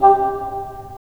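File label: wind bassoon; LA Composer vol. 3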